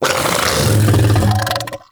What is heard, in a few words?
Vocal Burp Attack; Warframe; Hunting Critters; Undazoa